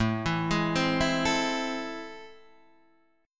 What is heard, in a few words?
A Minor Major 7th